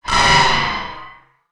Rocket Launcher Sci Fi

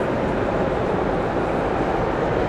Shopping Mall, Backgrounds

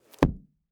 Book Close; Office Supplies

